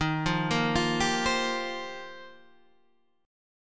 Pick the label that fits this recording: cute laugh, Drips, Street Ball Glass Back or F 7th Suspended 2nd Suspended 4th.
F 7th Suspended 2nd Suspended 4th